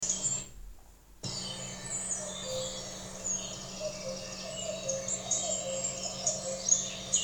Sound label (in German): kuckuck